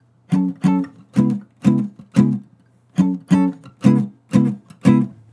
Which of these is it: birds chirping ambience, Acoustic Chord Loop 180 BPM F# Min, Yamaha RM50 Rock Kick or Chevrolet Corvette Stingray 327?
Acoustic Chord Loop 180 BPM F# Min